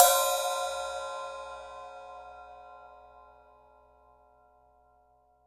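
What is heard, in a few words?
Hi-hat Acoustic open; Polyend; Simulacrum Sounds; 1 Shots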